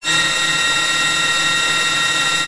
science fiction machine burst

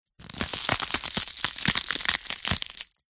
horror bone break slow crush; zapsplat

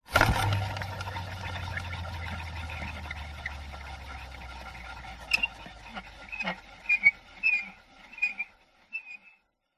wood spinning wheel spin rotate stop squeak

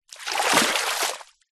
nature water shallow splash creek foot movement

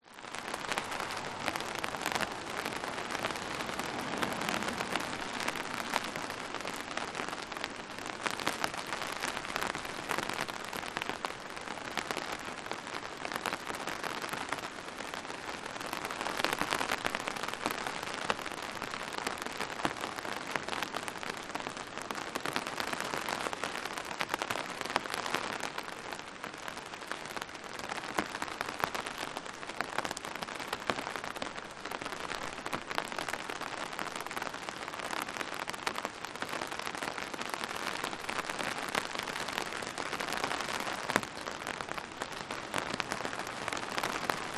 rain light umbrella occ cars pass; nature

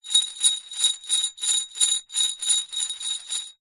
foley glass marble spin in empty glass bottle; zapsplat

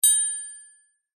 musical triangle single strike

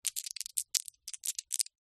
leisure marbles glass small x3 movement in hand; zapsplat

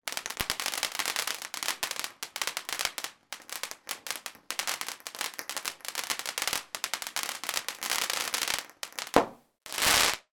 firework fountain sparks crackle explosion at end; sound effects one

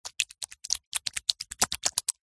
human hands wet rub together

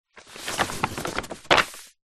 paper sheet a3 turn over; foley

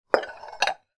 household stacked china bowl remove from other

